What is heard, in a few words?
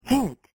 hit